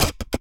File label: Camera Sequence